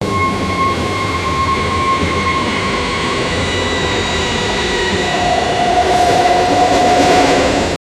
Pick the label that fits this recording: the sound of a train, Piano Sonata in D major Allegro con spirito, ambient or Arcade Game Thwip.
the sound of a train